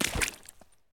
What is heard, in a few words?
water footstep